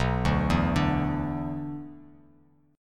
B-Minor Double Flat 5th B down, Bass Standard; Bmbb5 arpeggiated; JGuitar

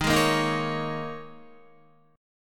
D sharp 7th Suspended 4th, Guitar

